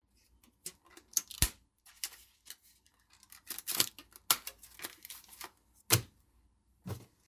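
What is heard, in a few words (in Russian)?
a stack twice wrapped with a rubber band and thrown on the table; Звук купюр, долларов, отдельно и в пачках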